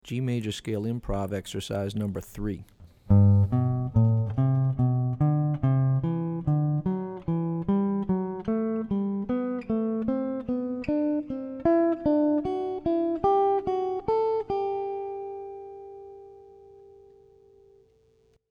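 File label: G Major Scale Improv Exercises; lead skills materials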